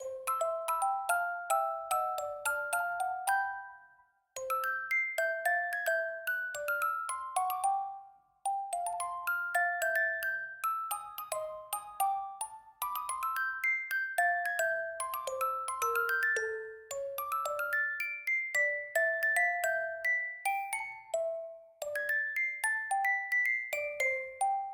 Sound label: Ground Night; SMM2; NSMBU